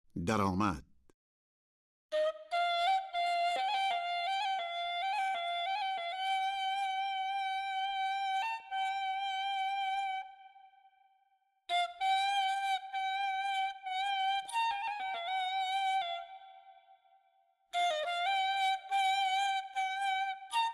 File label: Daramad Dashti Ney; Avaz-e Dashti